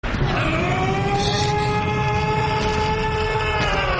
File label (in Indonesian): Nada Dering Anime Titan Roar; Eren